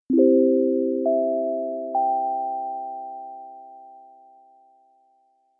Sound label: Japanese Subway